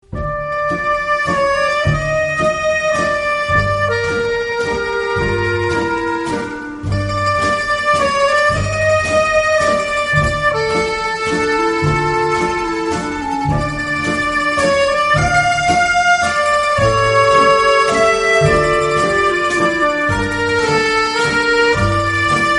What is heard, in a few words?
best italian tune ringtone